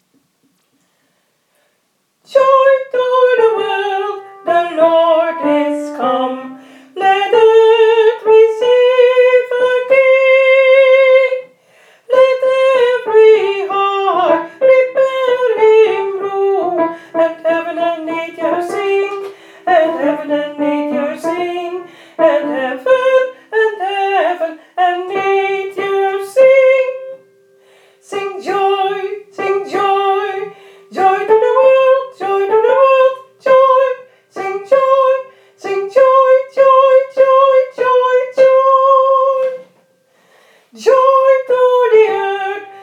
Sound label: Sing joy to the world sopr